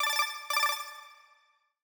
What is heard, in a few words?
calling